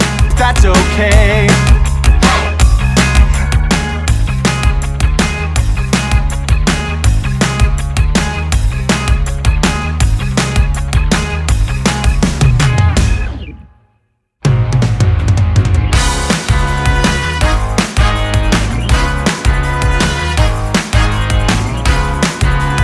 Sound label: Accompaniment; Descendants